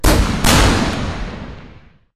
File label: Ambience Gun Shot